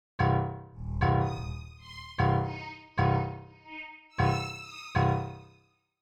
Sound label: suspense